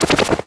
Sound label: Btl Con Escape; The Denpa Men; Android